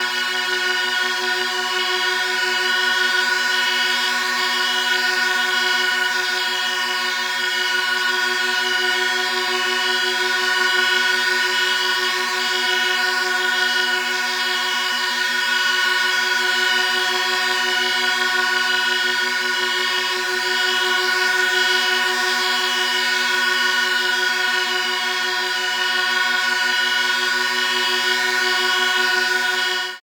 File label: Strange Bagpipes